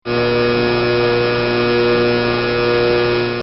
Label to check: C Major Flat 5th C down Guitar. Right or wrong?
Wrong